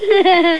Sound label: kid laf